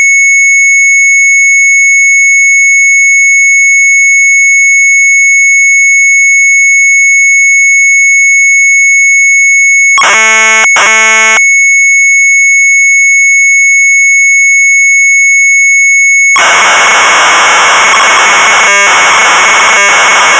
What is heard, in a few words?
MZ800 Tape; CHAR.LOAD; sharp; retromelsarchive